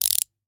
Rachet, vanadium steel, 8in, standard, locking, clicking, mechanical, new, socket, rotate, quick; Eiravaein Works; Vaeyan IV